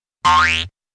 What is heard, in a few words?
bo wing